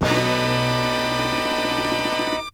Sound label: stab; Zero G; Man Machine